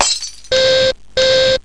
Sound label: PANIC